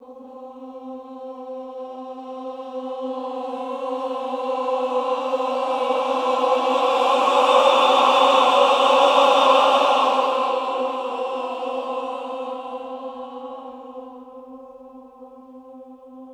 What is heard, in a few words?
VIBRA XTREME, Choir; Best Service; Extended Classical Choir